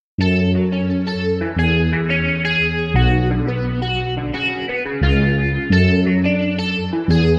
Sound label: Sting